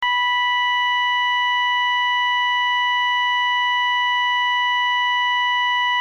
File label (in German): ton, Signalgeraete